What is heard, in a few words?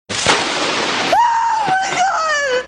lightning crack yelling oh my god